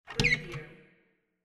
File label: cabinet door open